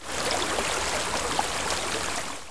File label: Stream